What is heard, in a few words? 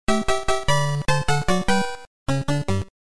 game over